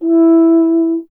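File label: brass French horn; Miroslav Vitous; Solo Instruments VOL-2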